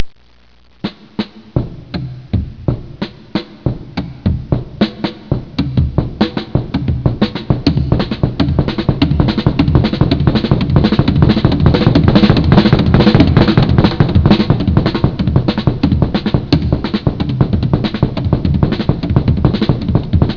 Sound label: triples, drum